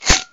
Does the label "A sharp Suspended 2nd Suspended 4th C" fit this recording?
No